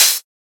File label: Open Hat; FLPRO